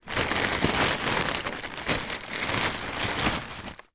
household disposable nappy remove from plastic pack